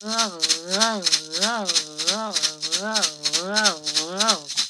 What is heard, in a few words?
level bird stretcher death